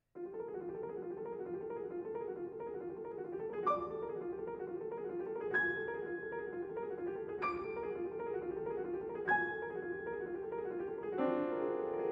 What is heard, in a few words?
Preludes